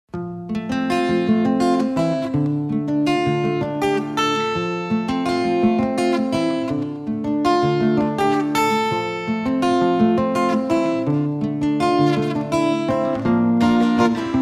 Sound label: Acoustic